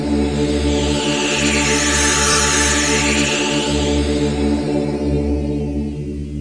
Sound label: upgrade Done; NETSTORM